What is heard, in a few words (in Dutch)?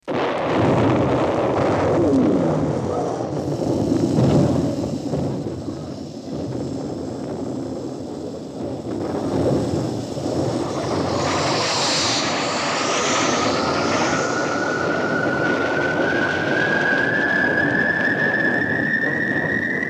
Intro Raketstart